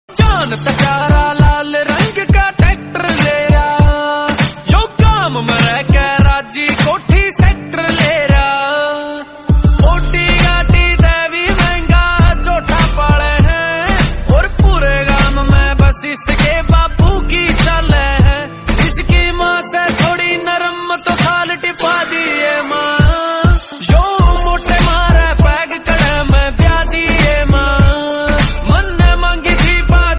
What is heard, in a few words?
haryanvi song ringtone